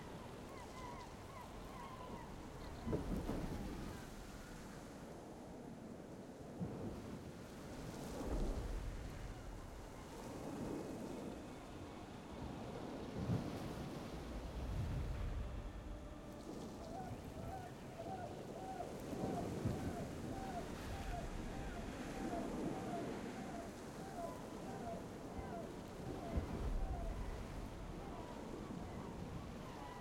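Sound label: ambience sentinel hq exterior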